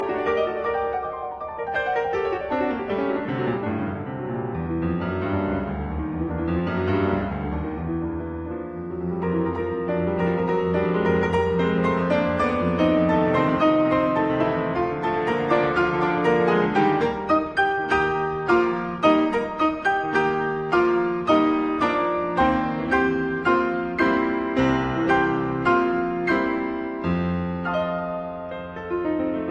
Piano Sonata in G minor So rasch wie moglich; Op 22; Juana Zayas

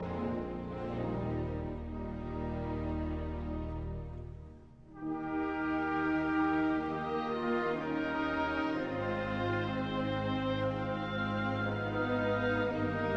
Deep River arr for orchestra

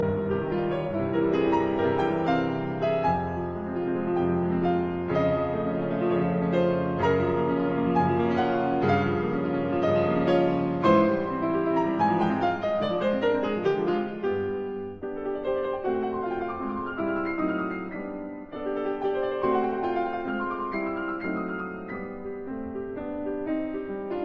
Piano Sonata in F minor, Allegro; Piano Sonata No 3; Op 14

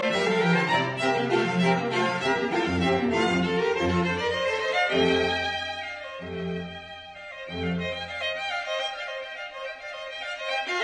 String Quartet in B flat major, Finale; Haydn; No 63; Op 76 No 4; Sunrise

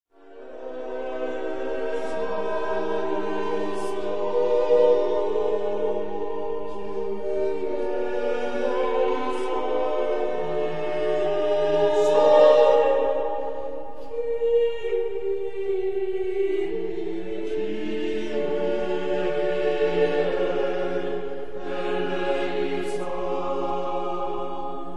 Missa Brevis Kyrie; Wuorinen

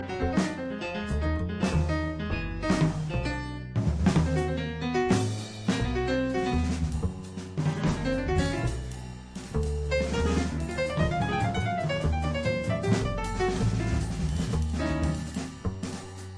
Trio; Paul Plimley Trio

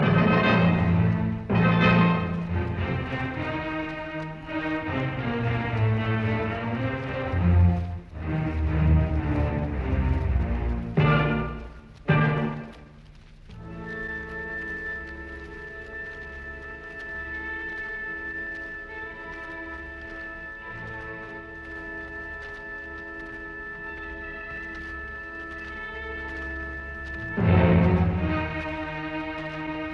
Symphony in D minor Choral Finale Presto; Symphony No 9; Op 125; Arturo Toscanini

